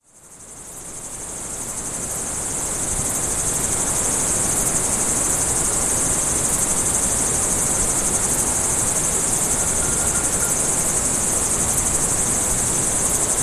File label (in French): sauterelles